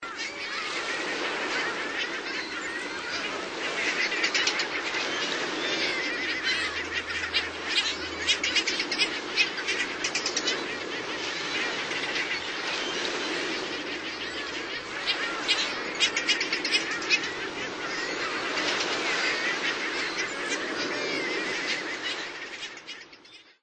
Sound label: Heermann's Gull; Birds